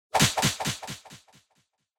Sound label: Hit Punch Delay